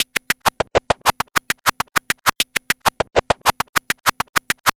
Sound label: Vinyl Scratch Clap; Digidesign; Pro Tools; Pro Tools Essential Loops; Dance DJ